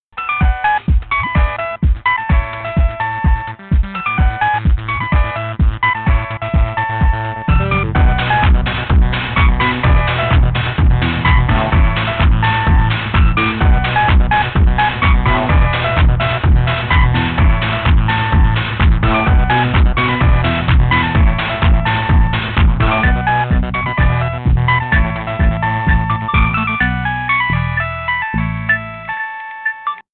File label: Ringtones Remix